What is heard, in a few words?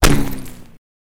Glass Debris Thunki